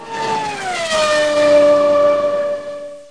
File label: Fast by, Bike; CASTROL